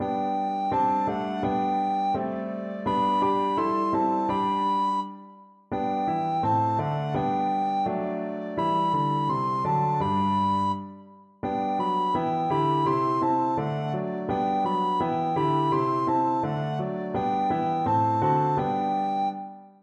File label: recorder